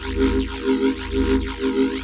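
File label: Ambience Sci-fi Energy Loop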